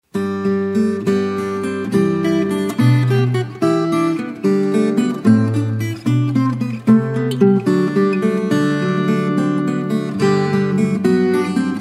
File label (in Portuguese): viola brasileira